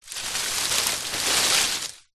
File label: palm tree branch drag forest floor; zapsplat